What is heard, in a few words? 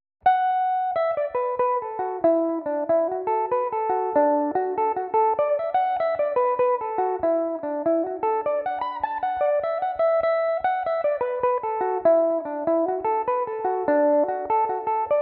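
E dorian, irish mandolin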